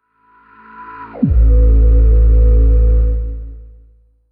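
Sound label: deep house FX, 125 bpm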